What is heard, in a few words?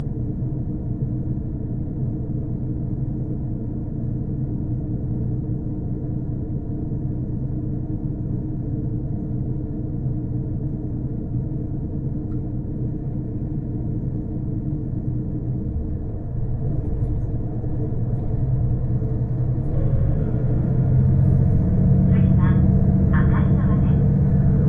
kiha40-700